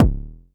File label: Tech bd, Jomox Airbase99